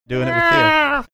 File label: meowing like the o